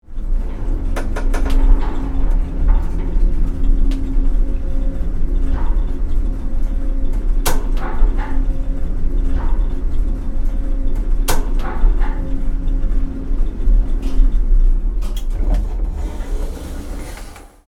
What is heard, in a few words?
Service elevator moving hum inside perspective